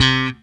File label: C3, Steinberger; InVision Interactive; Lightware VOL-1; Instruments & Percussions